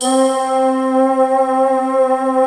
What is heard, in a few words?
SYNTH PAD